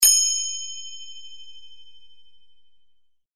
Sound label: open, kartal